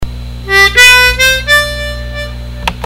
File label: up riff